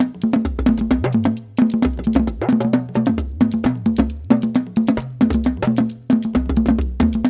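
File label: 132 bpm, Ju-Ju Talking Drum Lead; Spectrasonics; Supreme Beats; African Contemporary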